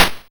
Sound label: Snare, YM, CHIPSHOP HITS DRUMS; ChipShop Deluxe